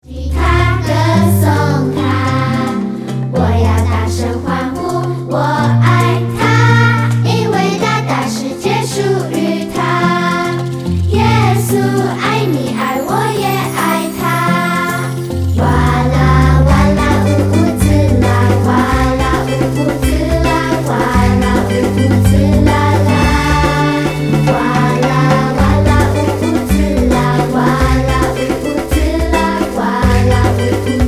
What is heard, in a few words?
Vuvuzela; 烏烏茲拉